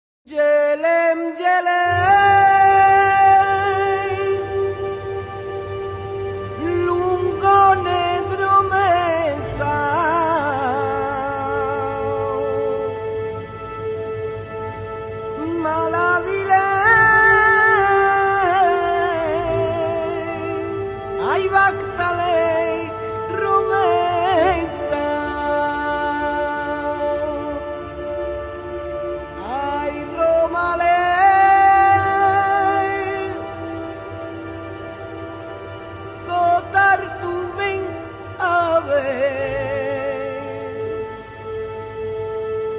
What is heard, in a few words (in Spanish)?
himno gitano parte cantada, Filarmonica